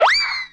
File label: DASH